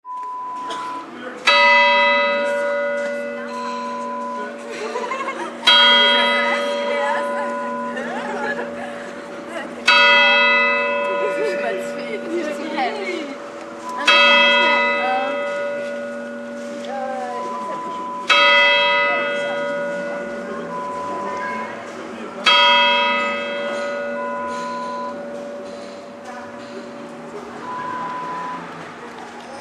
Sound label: Town Square sound